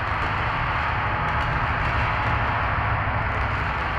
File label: sparse soundscape samples; ReLooped